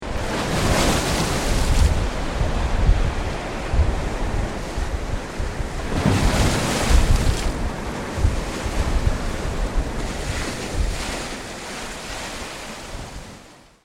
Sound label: sea; organicsound